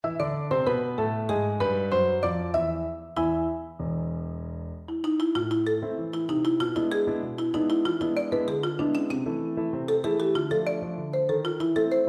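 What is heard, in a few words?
marimba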